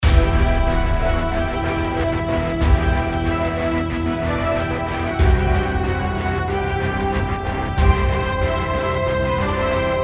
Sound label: Ringtone Epic; TankiOnline